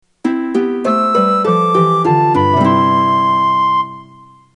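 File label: opening flute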